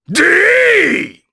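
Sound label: Vox Attack, Japanese; voices; heroes; Ricardo; kingsraid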